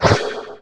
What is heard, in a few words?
hit ghost